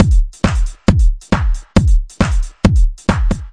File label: 136 bpm, clashy, drum loops and breaks